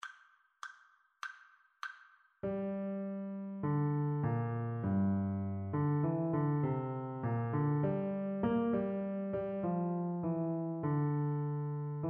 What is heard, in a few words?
melodic dictation; eartraining